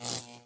Thunder Elemental; unsighted

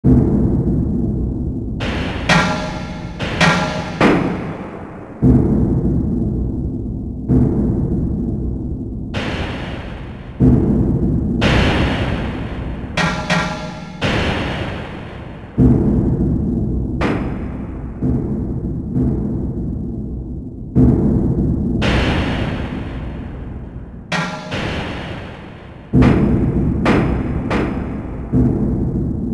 Amb Pipes

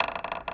Windmill Creak

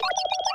Beep-Yellow; viewfinder

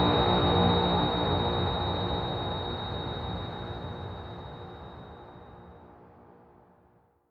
Ui Beacon Signal End

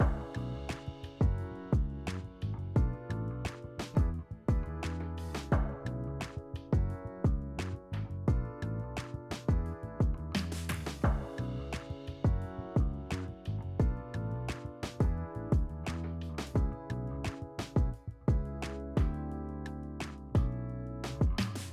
Outro Loop

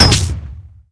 Reac Arm

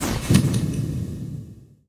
Balloon Deflate

